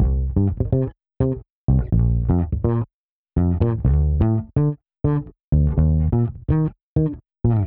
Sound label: 125 Fenbass half; PowerFX - Nu Jazz House